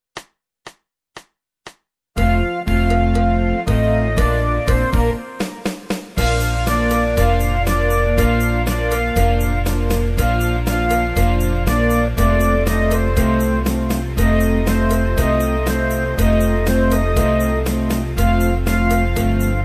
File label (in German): Hey Li Lee Lo aus Amerika, gitarre solo; Schulband Musizierklassen